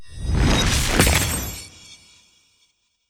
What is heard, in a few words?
Earth Splinters